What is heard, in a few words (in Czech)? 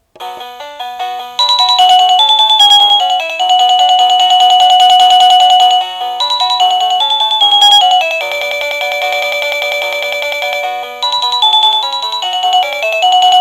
melodie